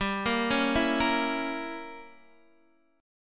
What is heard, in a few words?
G Diminished 7th, Guitar, Open D